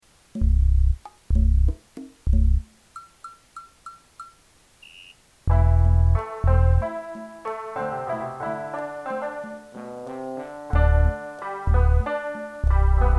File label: electronic music; Experimentations with sounds, rhythms, and technologies.